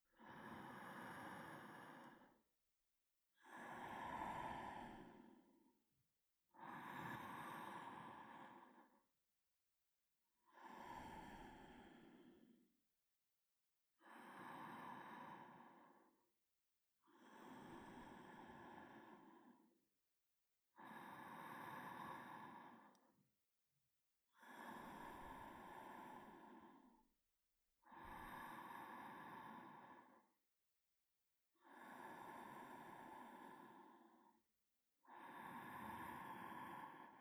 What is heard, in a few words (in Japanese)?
寝息トラック; 一緒におやすみ; vol.5 ウサギ